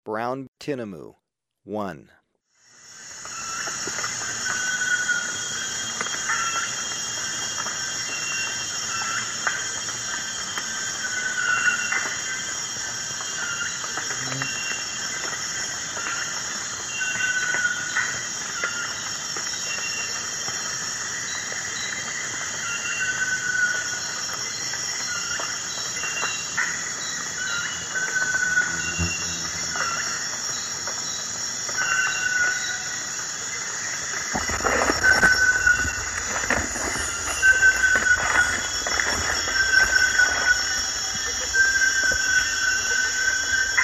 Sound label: Brown Tinamou, Ecuador; S. America